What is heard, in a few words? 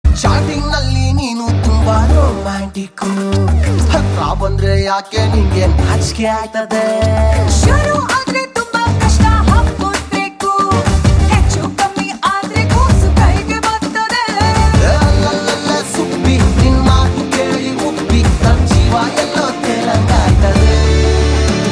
Male Ringtone